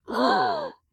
Zombie Death

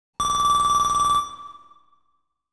TRAP Alarm; Game Sound Legend Series Apollon Game Music Box Memorial Sounds of Wizardry 2006

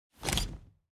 Camera Shot

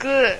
Rock

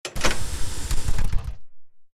Object Dungeon Bridge connect; starbites; Dungeon3

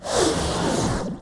Music Frontend

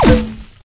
Ice Grenade Fire

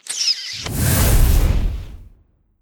Succubus Kiss

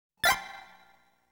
TRAP [Poison Needle]

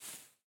Footstep Foliage Grass; Sx Footstep Foliage Grass Norm 02

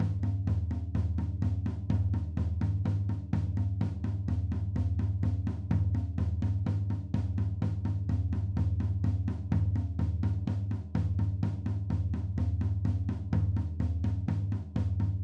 Gym Drum; unsighted

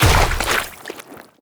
Big Brush Slash Impact; Paintmaster

